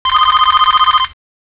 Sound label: Midi; LG; G1800